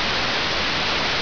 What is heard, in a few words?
fm noise; zps